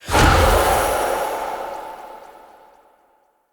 Rage Impact; Fey; Founders Champion

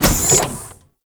Zariman Door Small Open; Ambience; Gameplay; Warframe; TheNewWar

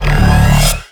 Mech Sword Slam Charge; Warframe; Nechro Mech; Abilities

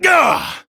Exertion; Warframe; Entrati Lab Quest; 2023 TennoCon; Arthur

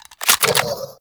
Reload Clip Out; Warframe; Weapons; Tenno

